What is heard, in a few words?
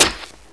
near foot light, antlion guard; hl2 ep1